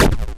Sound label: explode